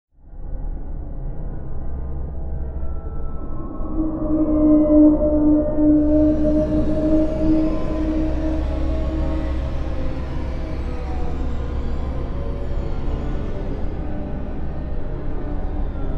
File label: Ambient, Jungle; Foundry; FoundryVTT; sr5 Dawn Of The Artefact; Ambiance